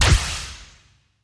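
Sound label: plasma weapon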